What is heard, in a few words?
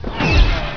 hit, freeze